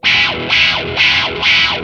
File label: WAH LOOP, Distortion Gtr; PlatinumPhattCD2; E-MU Producer Series Vol. 8 – Platinum Phatt